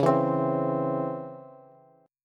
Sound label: C sharp Minor Major 7th C sharp Bass, chord; strummed